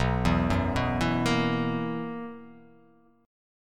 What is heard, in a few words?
B Major 7th Suspended 4th, B, down